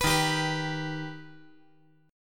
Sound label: Eb Augmented Eb; strummed; JGuitar